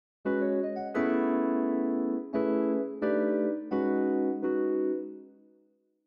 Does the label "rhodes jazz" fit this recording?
Yes